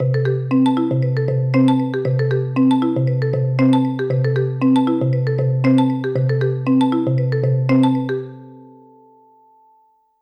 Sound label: Alesis SamplePad Pro